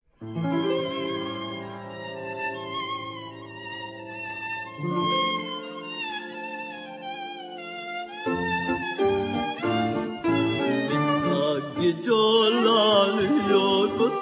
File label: nigun; czech